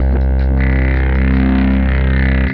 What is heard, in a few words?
Grindage, Bass; Sonic Foundry (Sony Creative Software); Synchro Funk